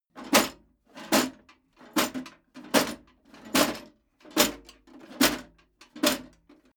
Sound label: metal plate armor movement sequence